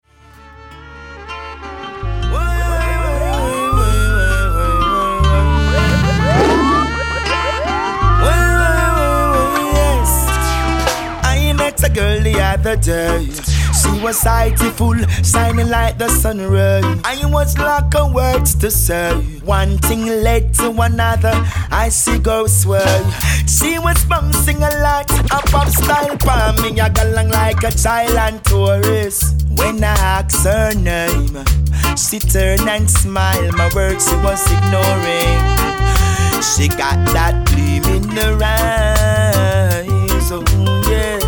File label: dancehall; TIGER RECORDS 2010